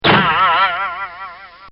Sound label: Cartoon Sound Effects Bongs